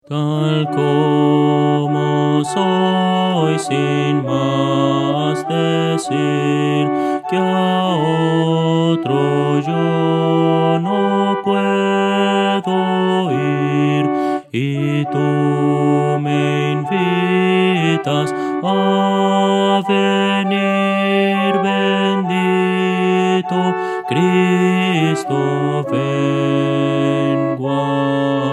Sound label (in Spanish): contralto